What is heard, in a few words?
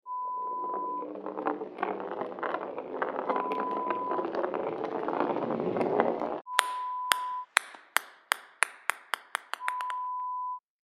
Ping Pong Ball Roll Floor Drop